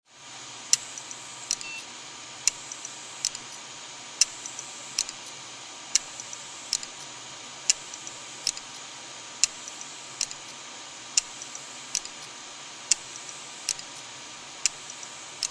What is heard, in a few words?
seagate sound